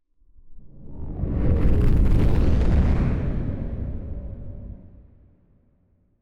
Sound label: ship colony ship move; Stellaris; shroud; ships